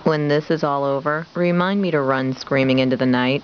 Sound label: screamin; speedtrapped